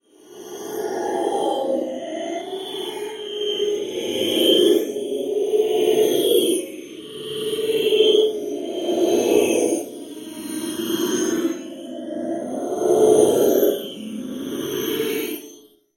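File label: sounds of scary voices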